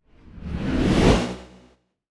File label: Up, Digital Reson, Intense